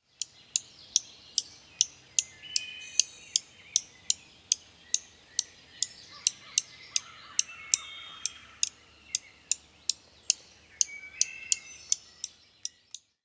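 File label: Dark-eyed Junco alarm chips; NY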